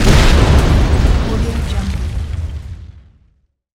explosion

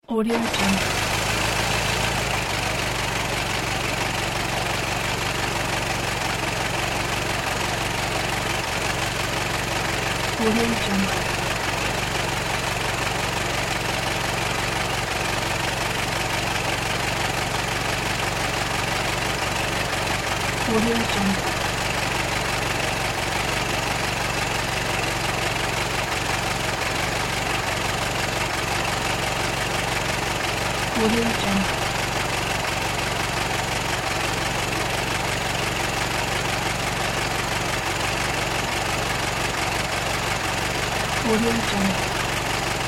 vintage car engine rev test

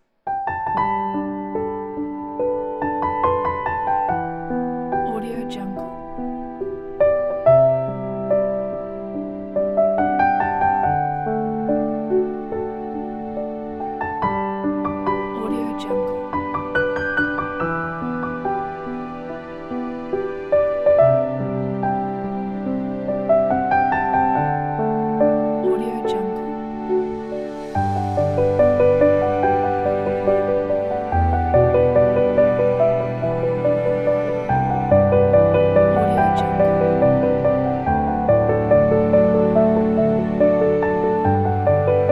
romantic

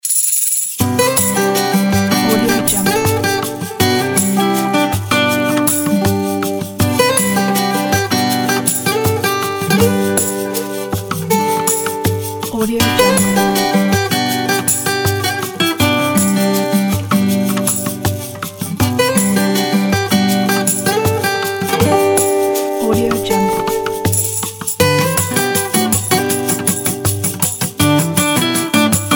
tender touch acoustic inspiring intro

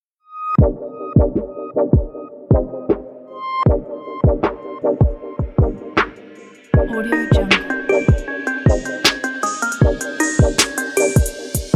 serious beat

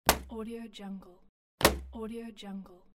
balloon pop; tibasfx

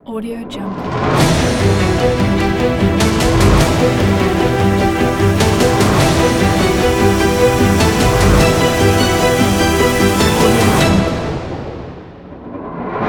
epic logo ident pack

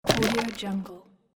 ship click

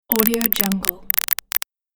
interference data output

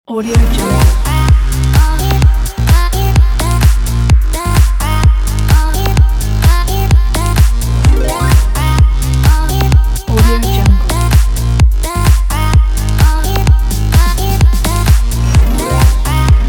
a fashion show trailer

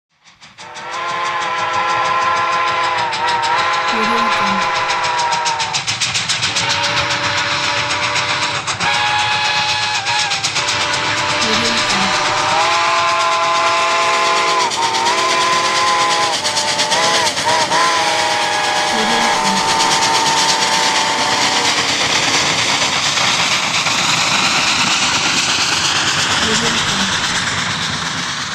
vintage steam train passing by

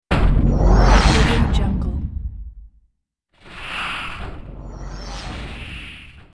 heavy impact with hole and medium whoosh away; hollywoodedge

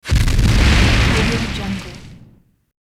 flame burst rumble whoosh; hollywood edge

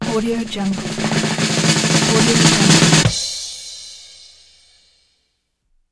drum roll

